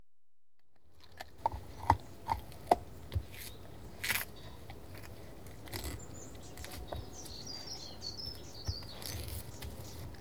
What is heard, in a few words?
One Dog Eating Bones - Perspectiv; Horses & Dogs; BBC Sound Effects Library